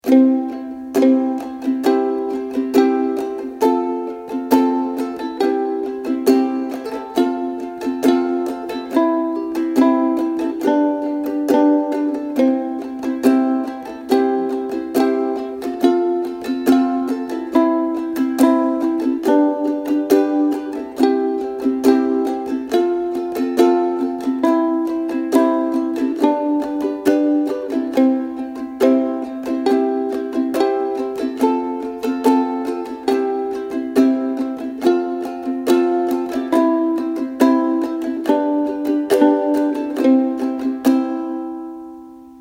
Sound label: Twinkle Twinkle Little Star Ukulele